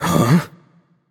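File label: nonverbal confused; voicepack; smite; merlin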